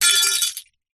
impacts glass piece broken light bulb fluorescent drop break smash